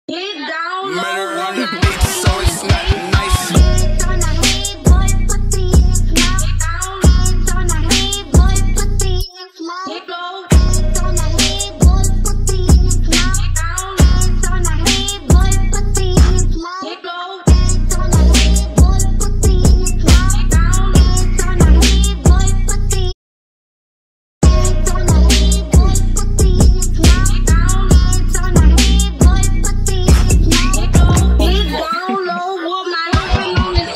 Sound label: instrumental